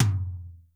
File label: REAL T1; Crypton - DrumBase5000; R70 KIT #1